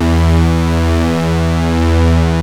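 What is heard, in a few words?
power solo, yamaha rm1x synth bass and lead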